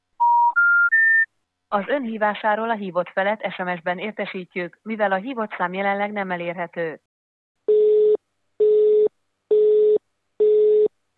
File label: cannot Dial